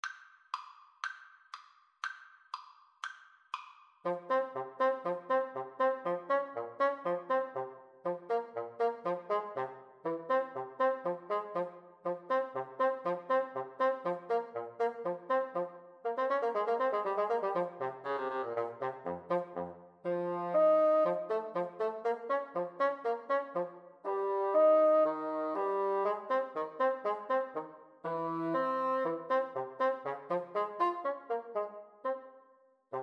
French Horn Bassoon Duet

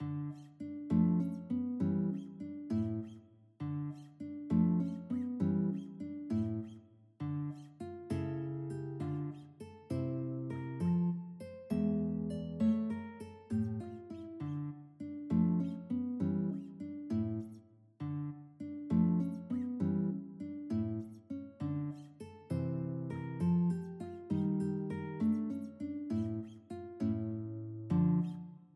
marionette, guitar

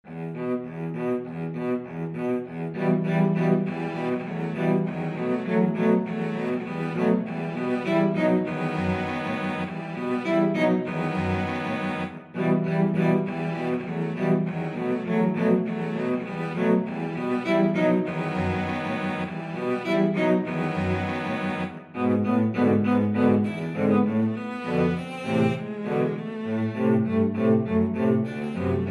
cello quartet

